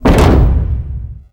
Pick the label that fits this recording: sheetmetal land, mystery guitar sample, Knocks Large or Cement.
sheetmetal land